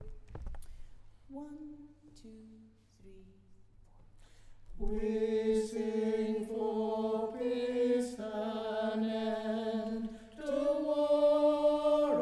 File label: peace anthem tenor, street choir